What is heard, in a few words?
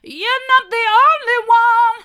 mid, vocal